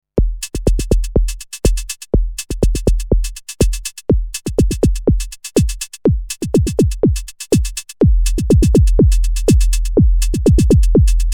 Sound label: Akai Tom Cat Kick